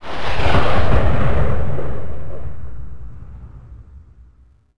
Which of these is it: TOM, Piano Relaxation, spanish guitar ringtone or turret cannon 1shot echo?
turret cannon 1shot echo